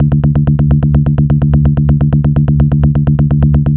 techno repetitive bass mid; bass loop

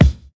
rich mid range bass drum sample c sharp key

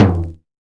small reverb tome drum sample f key; Duration 0:01; tom drum samples

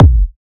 tight lows steel kick drum sound a key

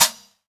closed hi-hat sound a sharp key; Duration 0:01; Bitdepth 24

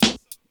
sparkly snare drum sample a key

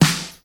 rich top end acoustic snare sample D# key

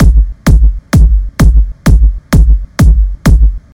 techno kick; drum loops; day dream

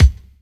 clean kick sound f sharp key; kick drum; kick drum samples

